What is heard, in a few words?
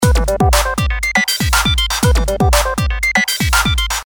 filter